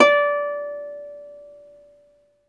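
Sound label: HARP E5 SUS; ILIO; Synclavier Strings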